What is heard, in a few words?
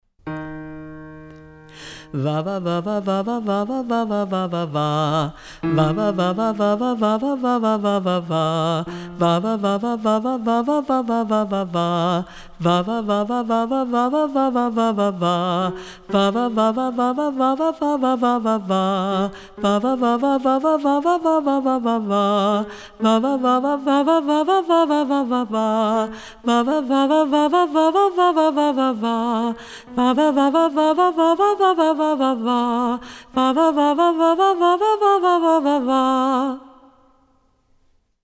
Mid Low Range Exercise; warm-ups